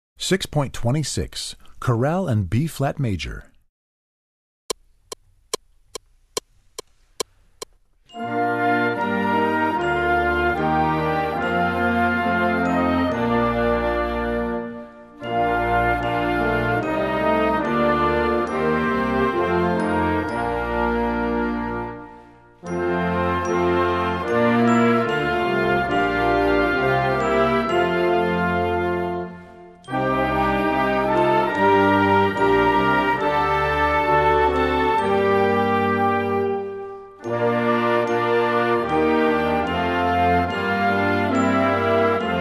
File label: Chorale in B-flat Major